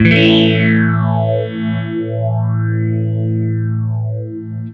Clean Phase A maj, Guitar Special, clean phaser, chords